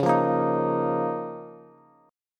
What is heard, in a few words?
Db 6th Add 9th Db down Bass; Db6add9 strummed; JGuitar